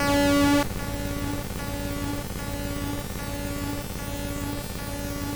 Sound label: alert glitchy